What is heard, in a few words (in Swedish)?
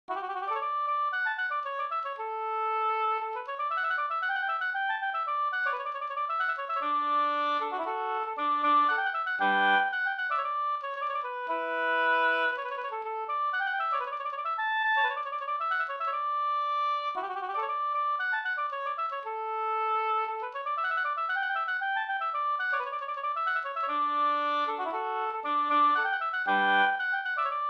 polska Bingsjopolska; Pekkos Pers storpolska